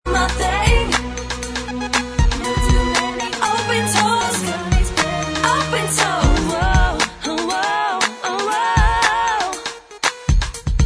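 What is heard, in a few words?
backing vocals Track, backing tracks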